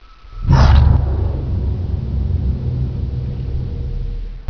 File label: monster grunt then eerie